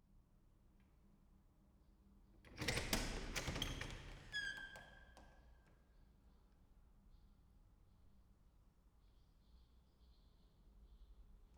Door Wood, School Classroom-Door, Old Wooden Door, Squeaky, Opening, Closing; West Wolf; Quiet School Ambiences